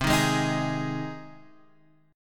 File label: C Minor Sharp 5th C Guitar Half Step Down